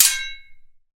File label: swords x 2 hit